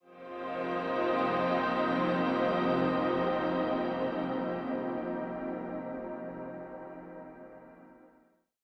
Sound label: Health Flourish; Insanely Twisted Shadow Planet